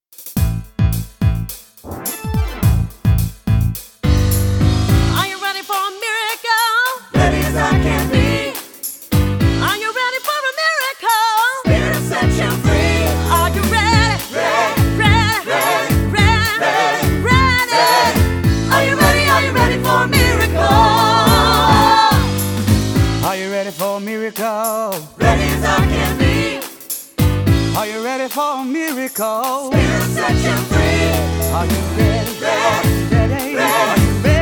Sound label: Choir